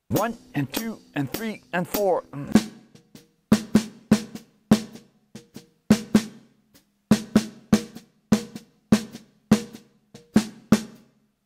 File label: Melody Swung 8ths